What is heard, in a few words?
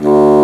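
Contra Bassoon, D0; Digital Sound Factory Virtuoso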